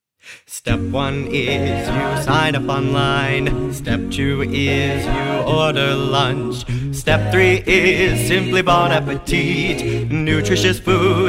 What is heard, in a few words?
Jingle; Choicelunch